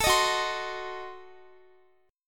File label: F sharp Diminished 7th Guitar; F#dim7 strummed; x,x,19,20,18,19